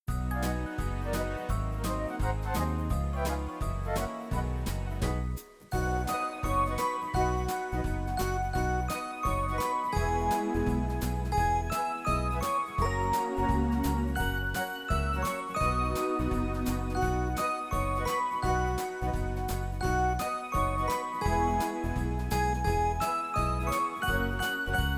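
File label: Jingle Bells, Keyboard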